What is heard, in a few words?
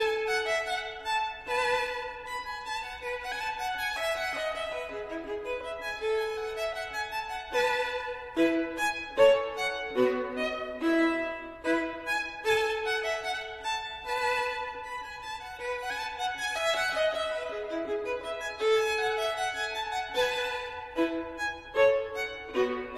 Gavotte en rondeau, Violin